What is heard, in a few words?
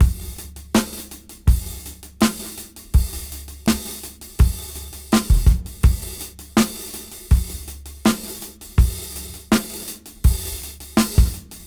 Slider 82 BPM; AMG - Keith LeBlanc Phat Butt Jams